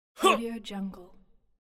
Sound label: male funny laugh